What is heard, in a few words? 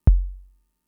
kick, Fricke MFB-522